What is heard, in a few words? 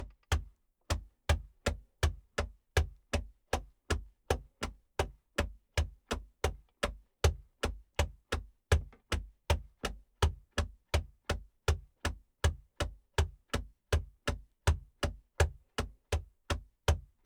Paw Wood Trot Walk, Creature Footstep; Joshua Reinhardt; Ultimate Creature Footstep and Movement Library